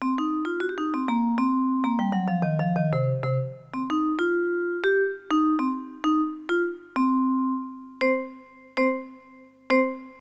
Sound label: Vibes